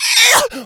been shot, infected; Left 4 Dead 2

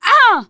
hurt major, teen girl; player; survivor; voice

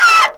claw scrape

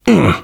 shoved

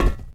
metal, footsteps run; survivor

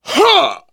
hurt major, survivor voice, biker

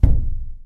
drywall impact soft; physics, plaster

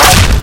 melee cricket bat; weapons, bat

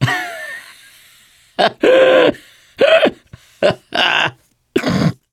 laughter, mechanic, survivor; voice

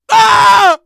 hurt critical, survivor voice; manager; Left 4 Dead 2; left4dead2 dlc3

